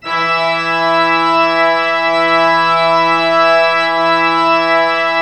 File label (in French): Orgue Eglise Full E4, Pipe Organ Full